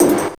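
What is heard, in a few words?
snare drum, 170 BPM, drum & bass; Ueberschall; Drum & Bass Flash Trackz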